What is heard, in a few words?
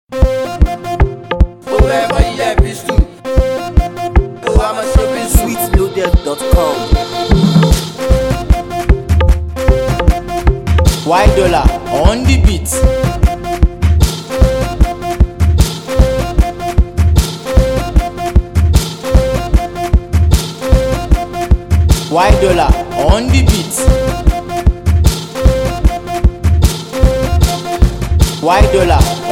Free Beat